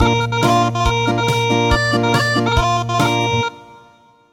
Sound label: Acoustic